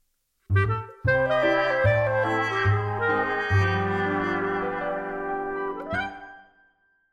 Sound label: Whimsical Sitcom or Animation Transition